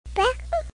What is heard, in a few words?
button